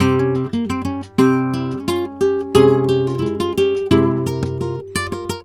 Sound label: flamenco guitar, tango; Best Service ProSamples vol.46 - Flamenco